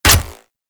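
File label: Infested Shot, bow; Cernos; Weapons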